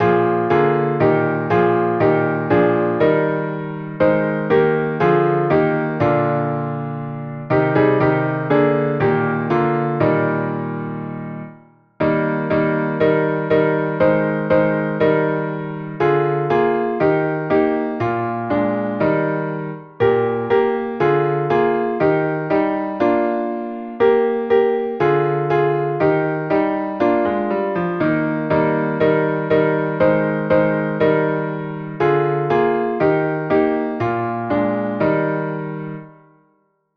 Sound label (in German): Klavier